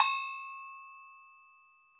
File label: Brzl Agogo; Club-50 - Foundations Roland